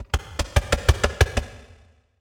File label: Hanger Steps